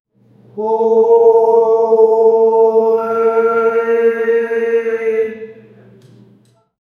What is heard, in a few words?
murmured chanting of temp, priest